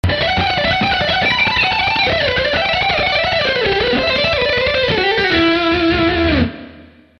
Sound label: Lick